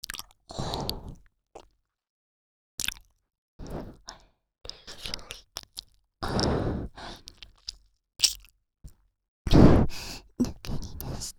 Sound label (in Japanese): 中に出して♪ 耳舐め喘ぎ